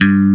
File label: ROCK BASS G4; E-MU Formula 4000 Series Vol. 5; Protozoa; Proteus1 Presets